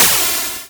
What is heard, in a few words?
arrow long shot; ludumdare; ld31